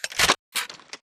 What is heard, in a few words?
krabersniper reload mag in; Titanfall 2